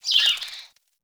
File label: Fey Flock Attack Dive